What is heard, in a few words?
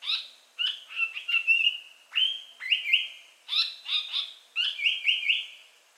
terpsiphone atrocaudata